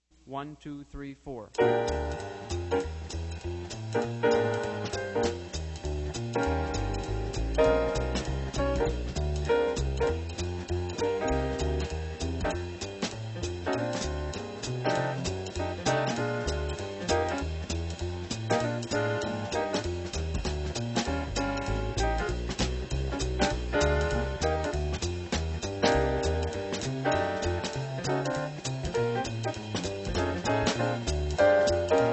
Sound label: blues